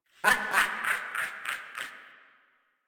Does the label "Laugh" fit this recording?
Yes